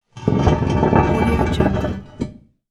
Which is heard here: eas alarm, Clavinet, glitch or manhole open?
manhole open